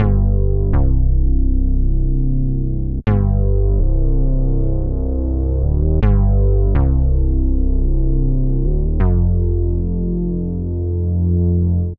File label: Super Boss Mini Bass C Minor 80 bpm; Bassline; MIDI Clips; Tonal; Ableton; Factory Packs; Chop and Swing